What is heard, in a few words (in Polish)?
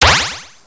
KASA